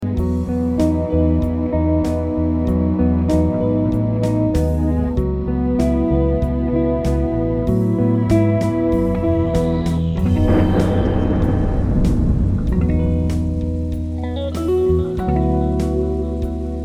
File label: Spirits of the Waterfall